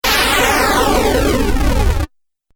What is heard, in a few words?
Fireball Video Game; Video Game Sound Effects